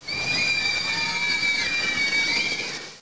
Scream Low; QuickDraw 3D 1.5; Gerbils!

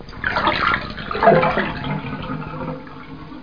water; 501 Great Games - Volume One; Guildhall Leisure Services

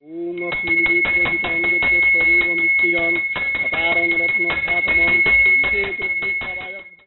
bell, Durga Puja

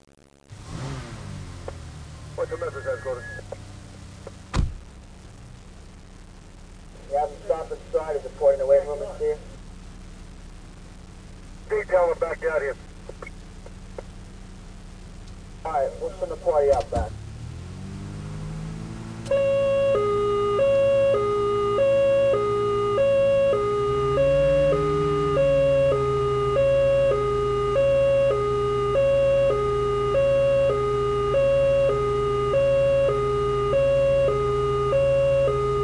Siren; disaster; police

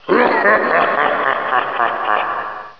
laugh; Hexen II

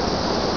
jet fly; baldies

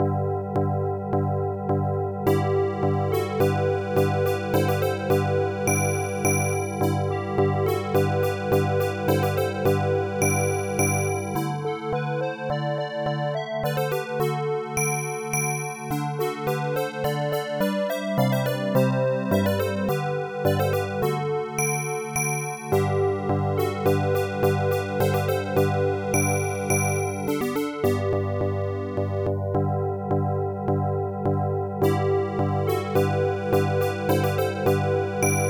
DRUM BOY

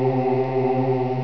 choir; Enciclopedia Del Perro

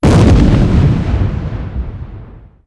Boom; Falcon 40

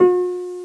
HARP

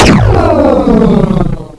Big Explosion; Zone Warrior

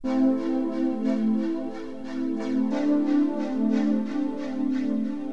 BLOW, HipHop, Keyboards; LiveAct Pool 1

